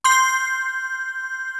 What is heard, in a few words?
BELLS; East West - Global Sounds